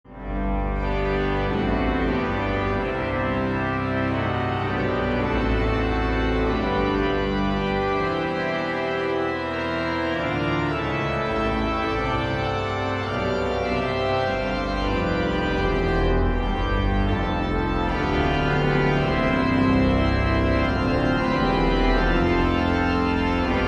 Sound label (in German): zungenplenum, pfeifen